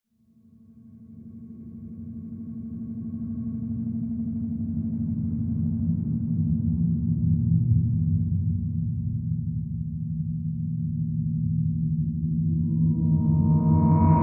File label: Imaginations Creepy Ambience, dreamy nostalgic; dark ambient music